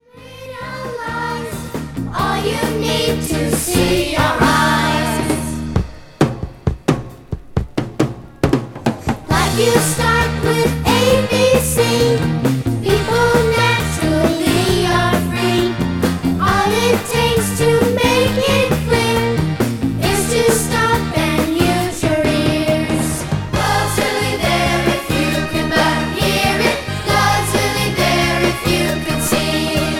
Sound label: BELL